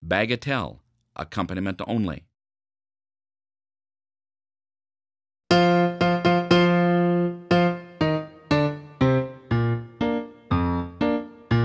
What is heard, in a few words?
bagatelle accompaniment only not horn